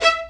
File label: Spicato Basses & Celli